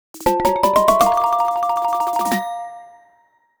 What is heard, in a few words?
Get Important Item Fanfare; legend of zelda the link's awakening; Golden Leaf Version